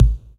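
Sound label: LO KICK, DRUMS; Kid Nepro - Elite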